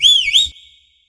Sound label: Whistle